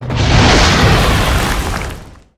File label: wind land